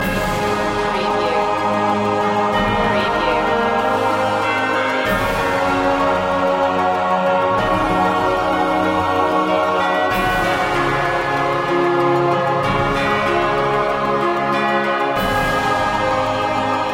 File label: loop